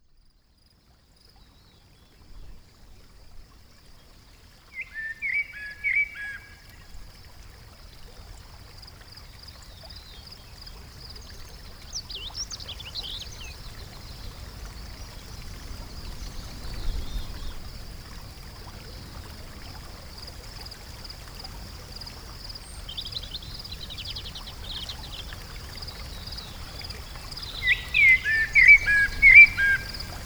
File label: nap time nature sounds